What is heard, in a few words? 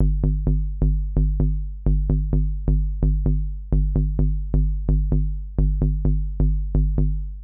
BASSLINE